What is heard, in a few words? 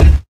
physics cube